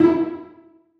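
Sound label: celli pizzicato d#4; Sonatina Symphonic Orchestra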